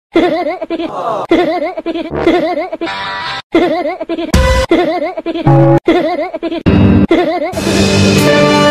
heeheh

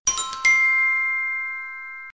correct

